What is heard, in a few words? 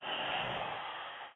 Breath